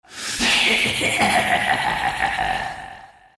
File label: laugh, zh; Appa